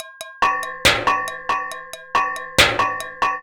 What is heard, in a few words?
Tins Topline (140BPM), household rhythm samples Loops